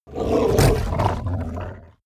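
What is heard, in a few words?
hellhound gnarl aggressive, creatures; kreaturen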